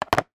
industrial small paint roller put down in plastic tray